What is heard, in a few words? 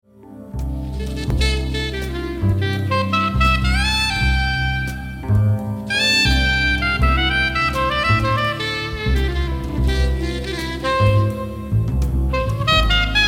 Song sop; Selmer SS